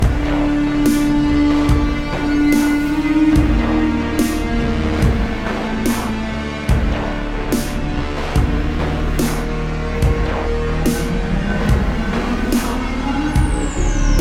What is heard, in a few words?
boss alt loop; Endless Zone